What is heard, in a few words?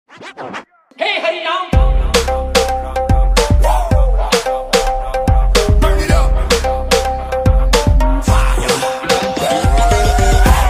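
bgm title track; bhool bhulaiyaa 3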